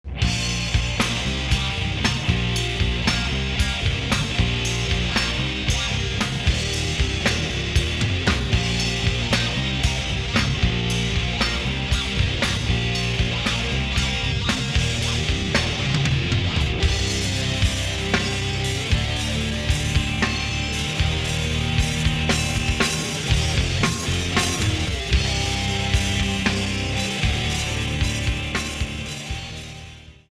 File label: hard rock